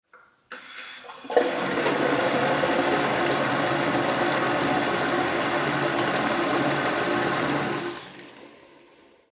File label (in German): WC Spuelung